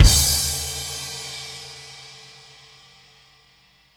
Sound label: BD Crash